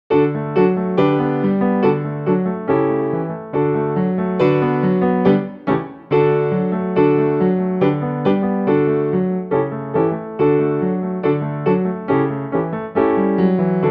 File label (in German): Playalong Klavierbegleitung